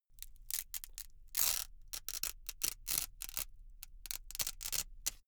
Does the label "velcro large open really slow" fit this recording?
Yes